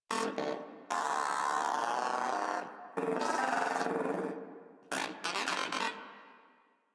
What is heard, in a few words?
Moa Aggressive Vocal Bored Mock Sleep; Warframe